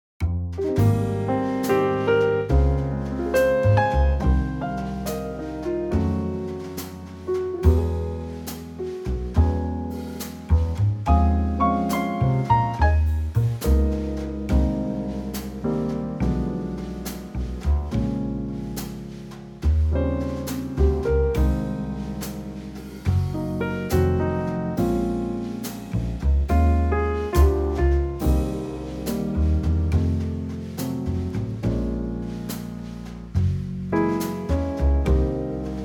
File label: Ab Trio